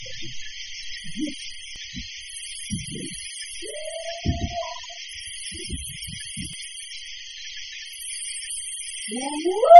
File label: Whoop; Intrepid India; Valley of the Apes